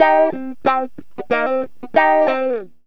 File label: GTR, CHANK; Zero-G; Funky Elements